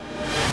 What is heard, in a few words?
Reverse Orchestra Hit, Yamaha DD-7